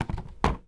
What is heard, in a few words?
Pickup Wood Picture Frame Set; Pickups